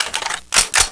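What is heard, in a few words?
pickup, weapons; SINDEMO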